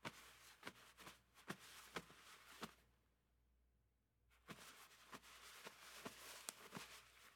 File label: Cloth Touches; Fairlight Sound Lib